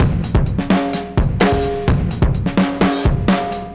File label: Funky Drums 128; musicradar